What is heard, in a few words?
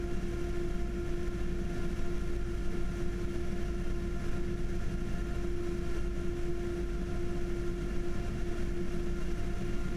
Max RPM